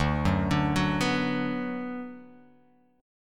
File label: D 6th D down, chord